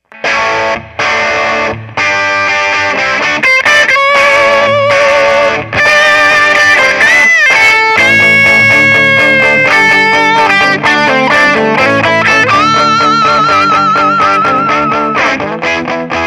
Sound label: SG Rhythm TH Lead Brite Up